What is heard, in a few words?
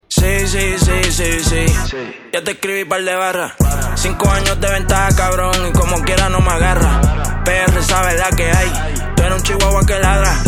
game over